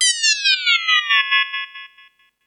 Multi FX

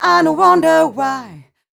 f mel d flat, fem Vocals

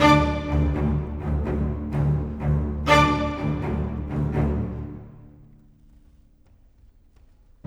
Cinematic Strings, Orchestral

